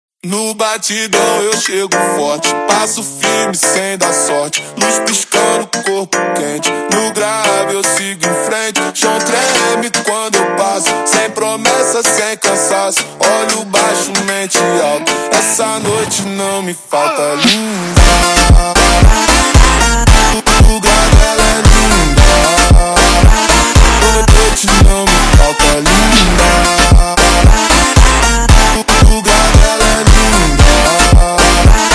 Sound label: Montagem Slowed